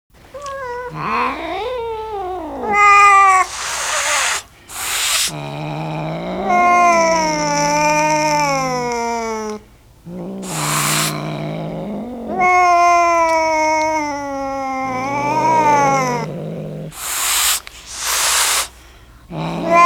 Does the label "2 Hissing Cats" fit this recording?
Yes